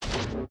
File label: atomic bomb inventory pickup; factorio 2.0.55